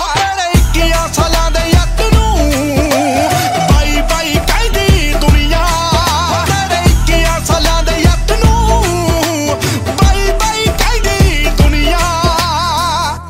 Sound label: Punjabi Ringtone